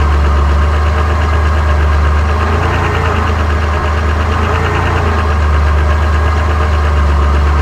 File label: Troop Truck Idle